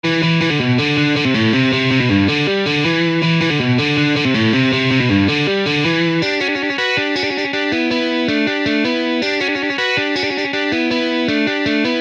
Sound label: Lick